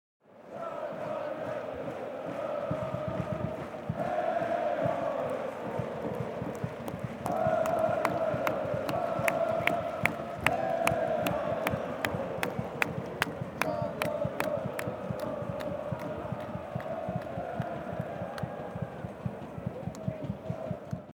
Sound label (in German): Stadion Fan und klatschen